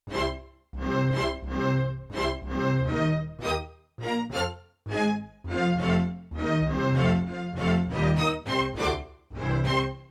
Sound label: Radio Tone, Orchestra Hit, Yamaha TX16W; Orchestra Hit 2